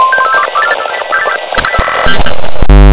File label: Glitchy